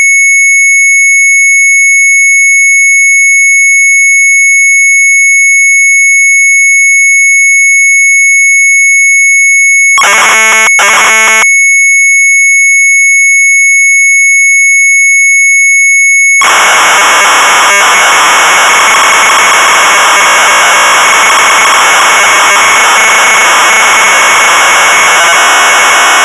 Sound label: Sharp MZ800 Tape; ETUDE; retromelsarchive